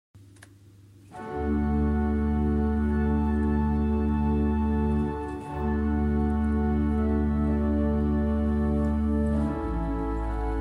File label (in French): Voix celeste; Nauendorf